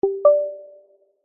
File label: multimedia alert prompt feedback tone simple sine chime 2 tone